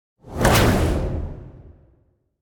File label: Dragon tail swipe